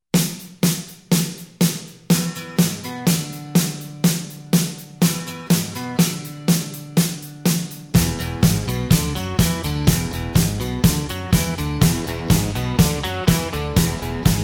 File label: disco